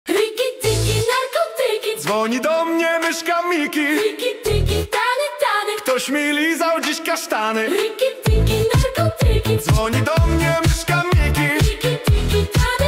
disco 80 s

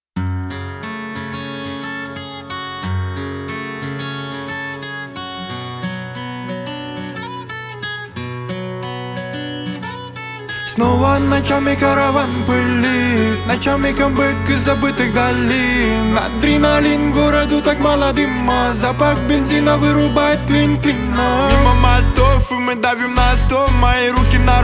acoustic version

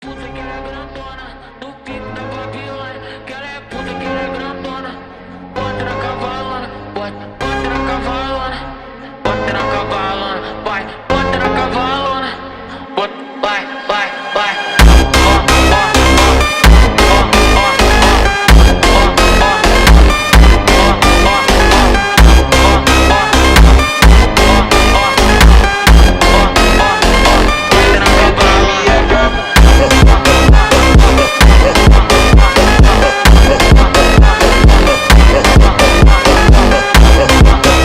funk phonk

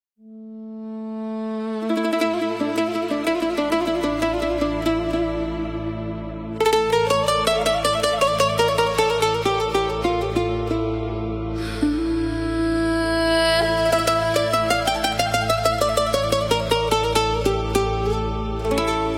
deep house remix

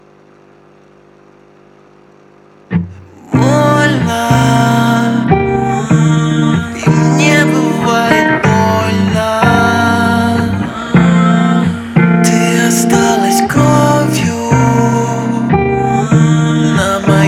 acoustic

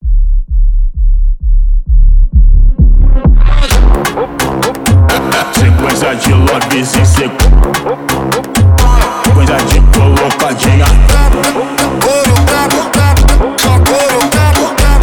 jungle funk

